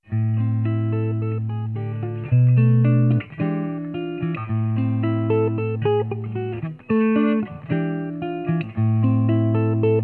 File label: Gentle Rain